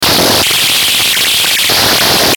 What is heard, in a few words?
Static Distortion Bright Transmission Burst; Production Element Imaging Element Accent Transition; Blastwave